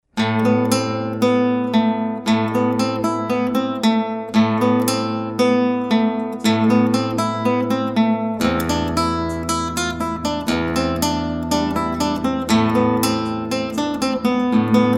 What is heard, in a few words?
Guitar; Rick Dangerous